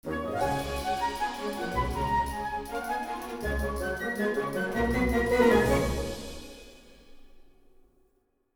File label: Music Cutscene Ingame; asterix obelix slap them all 2